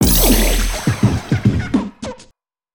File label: Celebrate; foley